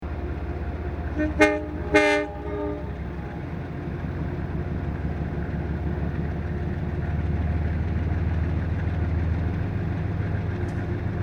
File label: horns; p32acdm